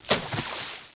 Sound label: splash; hatchet; gearbox